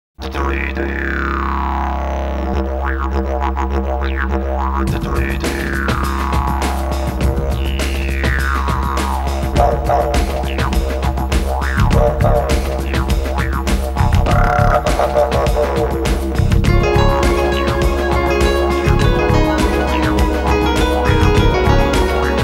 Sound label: didgeridoo; avstralija